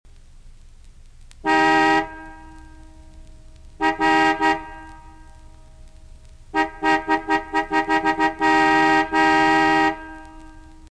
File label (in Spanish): pito car